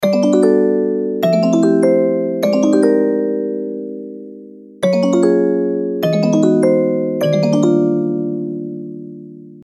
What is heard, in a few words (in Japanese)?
ミステリー オルゴール